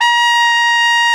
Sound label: BRS CORNET; Roland L-CD702; Orch.Ensembl